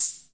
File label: hh, Wersimat 24; DRUM MACHINES